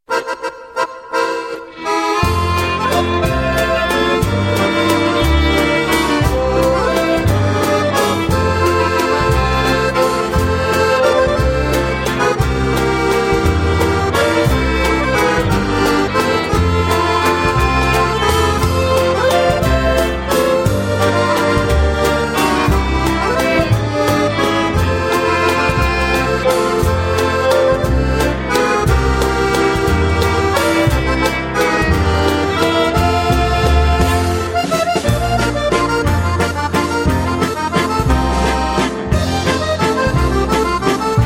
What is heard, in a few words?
Clarene Waltz